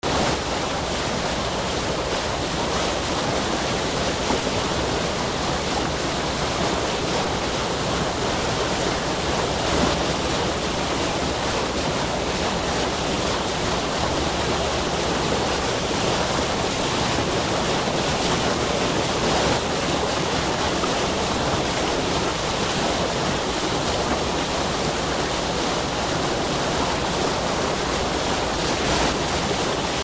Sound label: atmo harbor bow wash